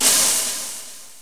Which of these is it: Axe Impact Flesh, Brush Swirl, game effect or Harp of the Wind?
Brush Swirl